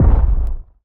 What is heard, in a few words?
reel Bounce; OceansGold; netent